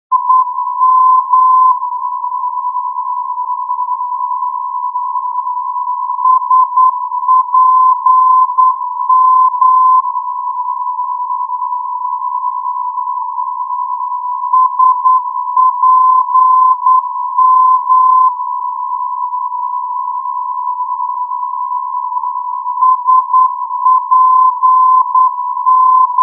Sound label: ndb speyer spm-350; europe